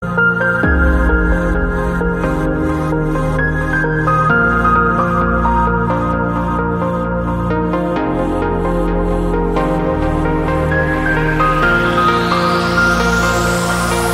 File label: ringtone, phone, iphone